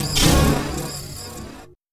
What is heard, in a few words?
Metal; Sonic Foundry (Sony Creative Software); Esoterik Beatz; Mecan FX